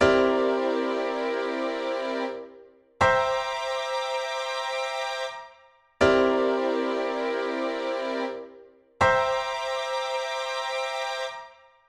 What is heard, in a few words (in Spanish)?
acorde novena